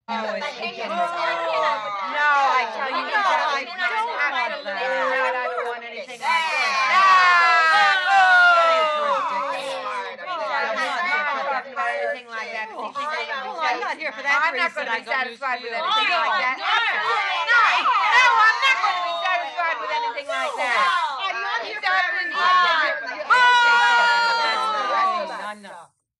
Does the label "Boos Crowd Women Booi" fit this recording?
Yes